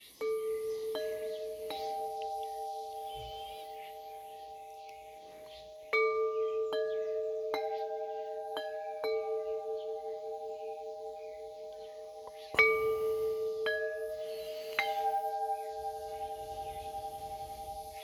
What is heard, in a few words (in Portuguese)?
Triade Menor